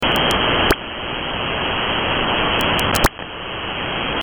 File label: chirpsounder